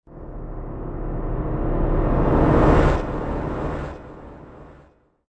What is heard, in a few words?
Swoosh - As The Tension Builds; Whoosh Sound Effects